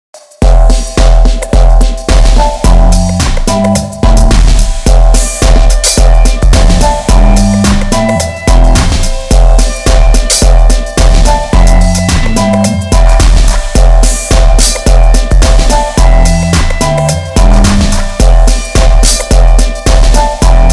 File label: beat